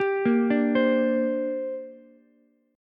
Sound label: A sharp 6th Add 9th, Ukulele; A#6add9 arpeggiated; JGuitar